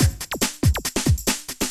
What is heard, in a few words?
techno 140